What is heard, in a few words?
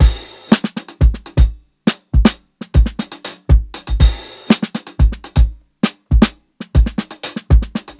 60 BEAT, DRUMS LOOPS; BASEMENT DRUMS VOL 2